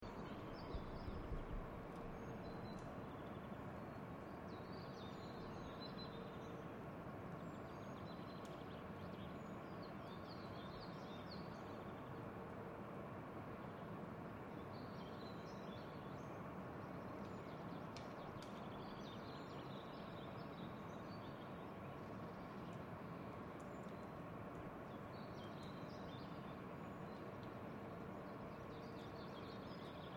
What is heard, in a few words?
Birds Summernight, Birds singing in Swedish summer evening. Constant sounding ventilation near; Outdoor Sound Effects; LYN102 package of City Sounds